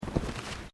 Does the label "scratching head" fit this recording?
No